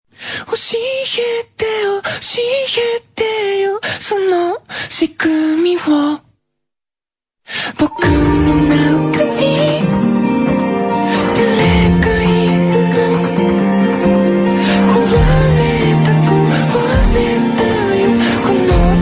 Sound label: Ringtone, Anime ringtone